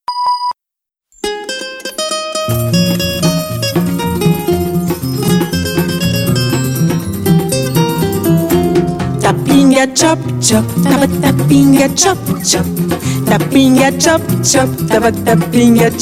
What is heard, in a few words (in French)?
Musique engin surprise